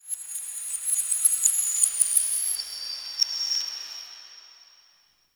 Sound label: Zariman Metal Whispers; Warframe; The New War